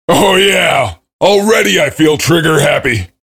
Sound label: cheer; svencoop; voice commands; SeriousSam